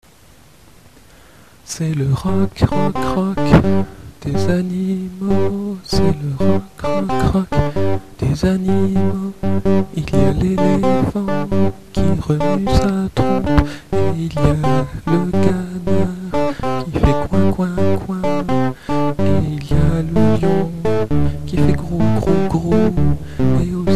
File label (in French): le rock des animaux